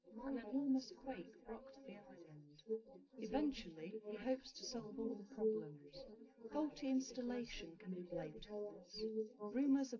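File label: female, live recording 130ms 1m; SiSEC11; underdetermined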